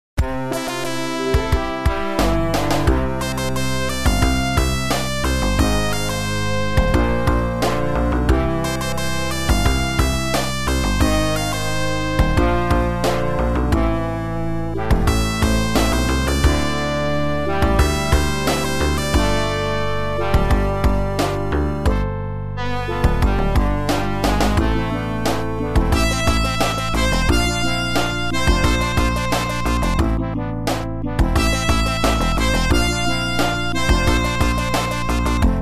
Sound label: ending bgm; sonic2